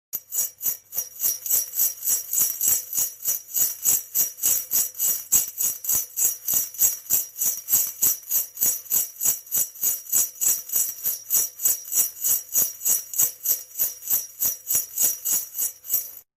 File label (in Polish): dzwonki Mikołaja; Grudniowe Opowieści